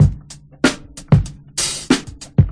95 bpm dry feeling, drum loops and breaks; 091 to 100 bpm

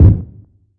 kick lofi double; Kicks; single hits

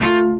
orchestra string hit D

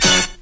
synt orch hit; Punches Hits Discoblasts; single hits

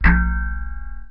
resonant hit; Weird and Interesting Hits; single hits

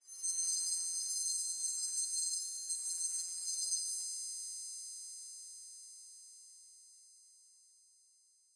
comb effects high, Electro and Synthetic

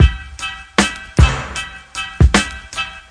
hip hop, DRUM LOOPS and BREAKS, 077 bpm

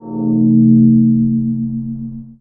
resonance gong; Gongs and Super Crashes; single hits

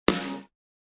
Gothic Snare, DRUMS, REAL LIVE KITS; Osama Qureshi DrumKit

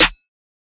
Happy Layer; drums; hip hop; STYLE KITS (Hip Hop, Etc); Khezie Hip Hop Kit

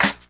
snare

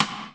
rim, Alesis HR16 drum machine; DRUM MACHINES

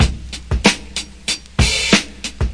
95 smooth sailing funk, drum loops and breaks; 091 to 100 bpm

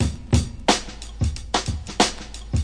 91 squeaky pedal groove, drum loops and breaks; 091 to 100 bpm

